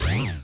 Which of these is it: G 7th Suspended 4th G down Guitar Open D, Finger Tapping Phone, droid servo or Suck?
droid servo